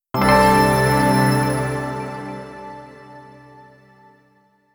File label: Jingle You Got an Accessory